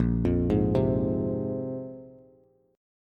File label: Bb 7th Suspended 2nd down, Bass; Bb7sus2; arpeggiated; JGuitar